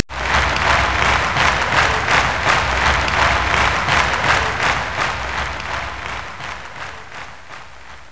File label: Applaus; Interactive Cosmos; Kosmos; Puzzle